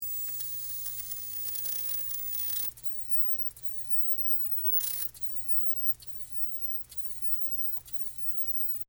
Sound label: Television Static, Technology, Television, Static, Large, Tube, High, Pitch, Tone, Loop; BlastWave